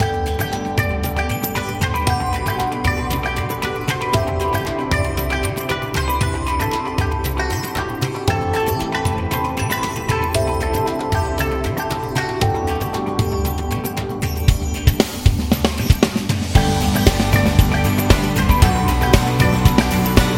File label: Happy India Travel; sounds of the world